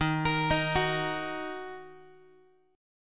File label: D sharp Minor, Guitar; arpeggiated; JGuitar